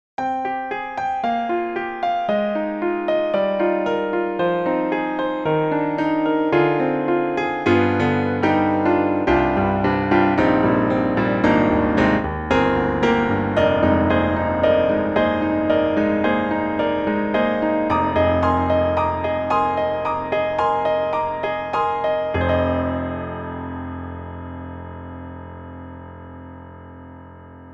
Roland Juno-Gi Juno Piano

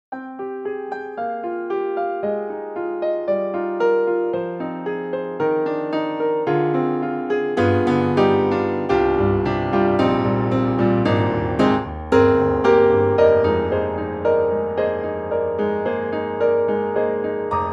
KORG KRONOS 2 German Dark Grand

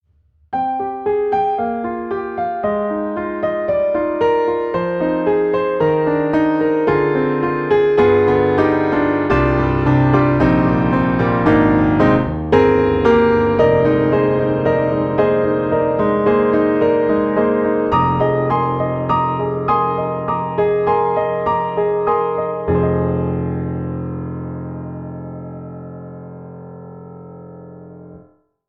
Pianoteq D4 Blues, piano; MODARTT S.A.S.

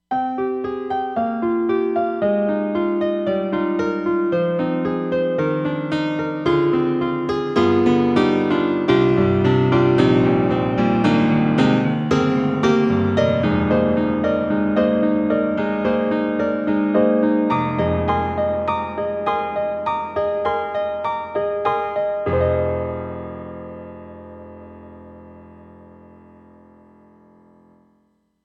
Roland SD-50 Stage Piano

